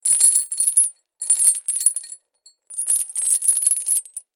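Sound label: sounds of chains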